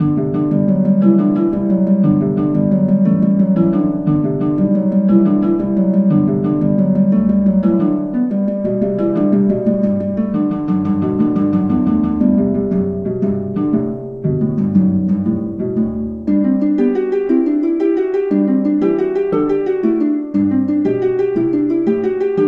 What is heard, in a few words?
Harp; The Bard's Tale Remastered Trilogy